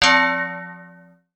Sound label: enough, notification sounds